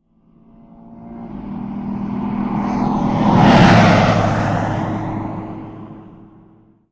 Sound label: truck; Blind Drive